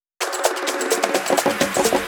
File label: Fill 128 BPM; Vengeance Samples; VEH1 Loops